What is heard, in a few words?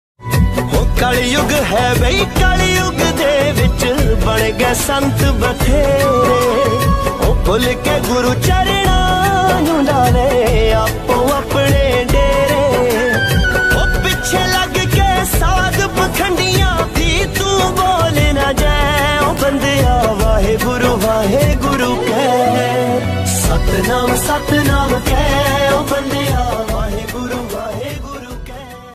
shabad gurbani; satnam waheguru; dharmik